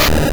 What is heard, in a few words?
Tackle, Battle Attacks Normal; Pokémon flicker briefly when hit; P3D-Legacy